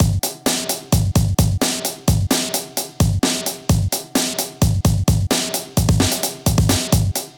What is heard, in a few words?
DnB Beat 170bpm, MIDI Drum; Ableton; Factory Packs